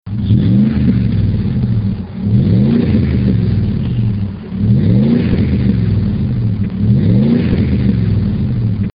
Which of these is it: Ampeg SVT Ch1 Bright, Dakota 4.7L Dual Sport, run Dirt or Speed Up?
Dakota 4.7L Dual Sport